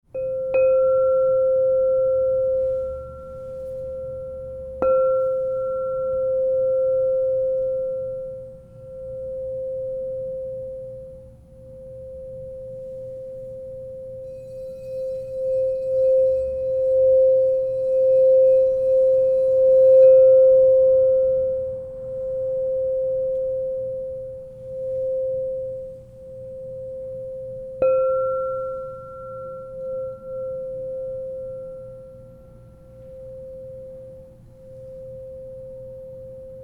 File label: Crystal Tones Singing Bowls